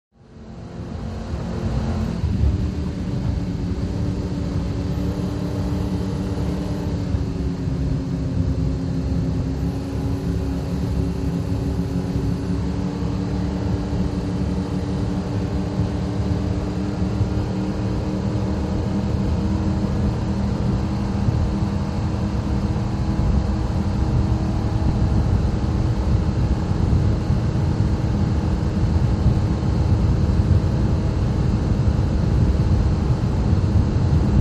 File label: Power Boat Int Racin, off shore power boat